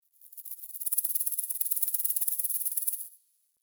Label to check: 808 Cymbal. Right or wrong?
Wrong